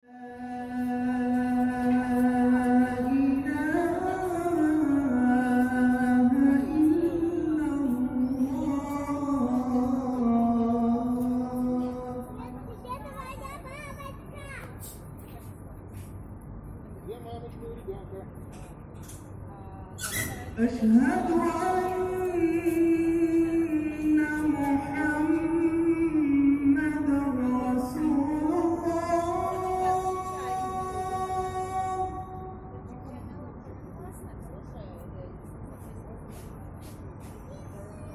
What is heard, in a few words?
mosque singing, kazan